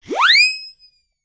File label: comedy siren whistle great for slips and trips